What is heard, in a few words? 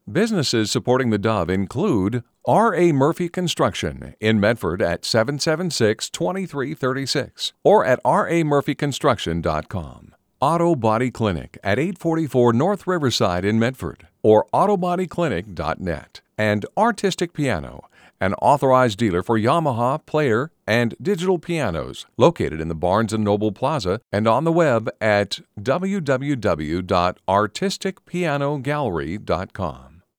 Artisic Piano; R.A. Murphy Auto Body Clinic